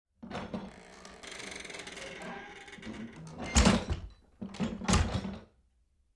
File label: wooden door closes with creak